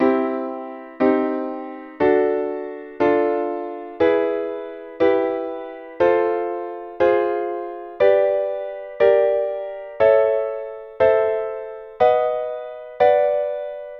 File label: easy chords